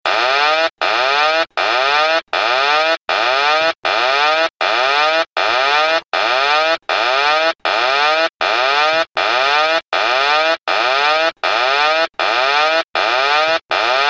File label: autopilot disconnect classic boeing